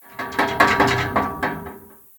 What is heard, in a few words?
Metal Bars Random; The Town